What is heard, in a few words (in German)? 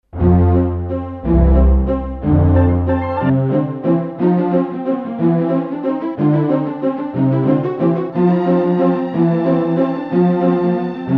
Walz, Dance